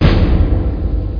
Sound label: thump; Quake; PainKeep